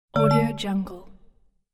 mallet glock notify

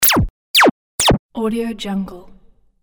laser collection

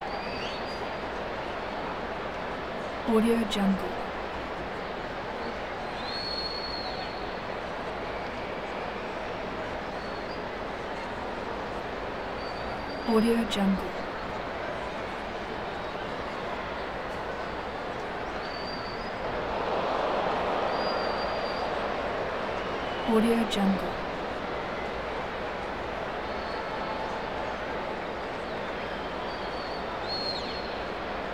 baseball crowd idle chatter whistles

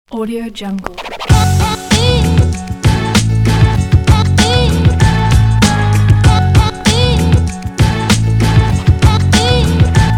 hip hop

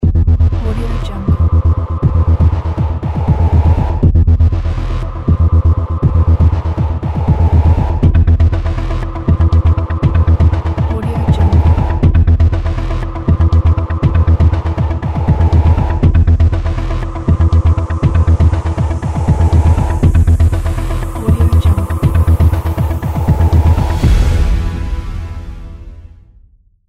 cinematic thriller ident

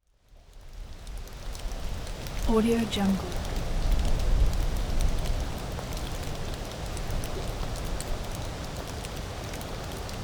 rain and wind

